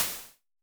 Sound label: Snare Hats, Vermona Mono15 Drums